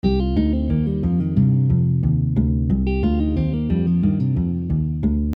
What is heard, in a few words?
minor 251